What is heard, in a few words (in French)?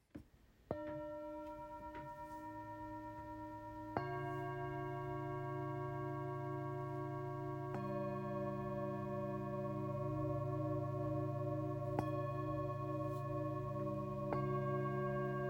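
Set, bols chantants tibétains; synergie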